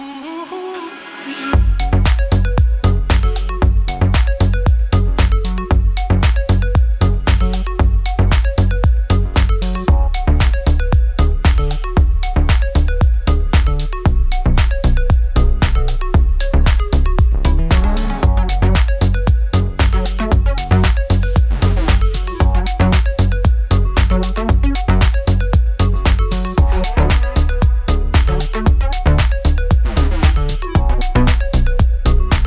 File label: Instrumental Remix